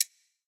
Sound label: button Down; templeofnudges